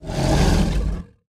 mindflayer hurt, utterance

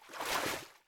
water splash, footsteps

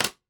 click, ui

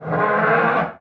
wyvern hurt

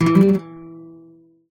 guitar; voxygen

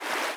fast water, river sounds

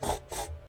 pig calm

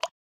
running water, river sounds

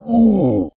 ogre hurt

